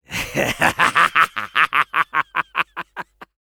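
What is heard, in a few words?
laugh happy, French; Medic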